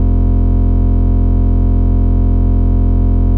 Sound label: Drone Static; Drums & Drones